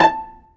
STR PIZZ E4, Strings; STR SECT #1

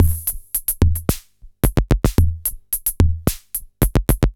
retro drum machine Loops 110bpm; Tape A; SR88

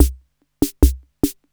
66 HABANERA, DrumBase5000, TR-66; Crypton - DrumBase5000

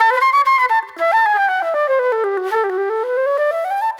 Soul Plate Flute

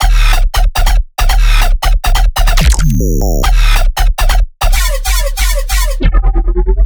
bass loop 140 D; Virtual Riot

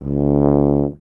Tuba, Solo Instruments; Miroslav Vitous - Solo Instruments VOL-2

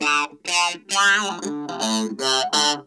T-TIME, Funk Guitar; 90 s Sample CDs; Zero G

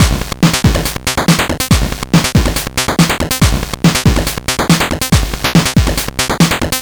Game Boy Break Loop, 8-Bit, Drums

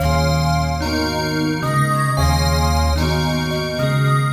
Gonk Chime; Sonic Foundry (Sony Creative Software)

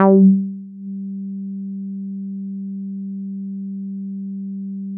Synths BASS

